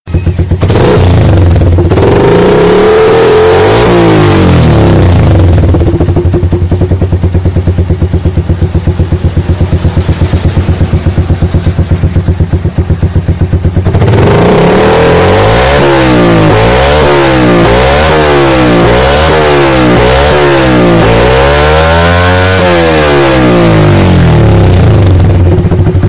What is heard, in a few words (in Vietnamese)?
Tieng Po Xe May Keu To